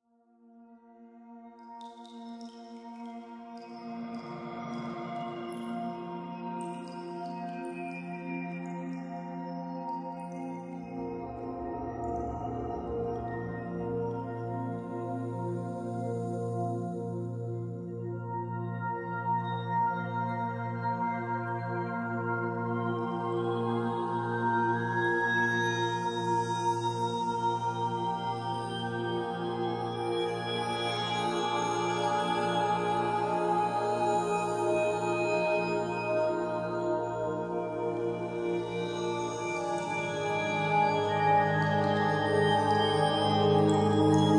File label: Ambience BGM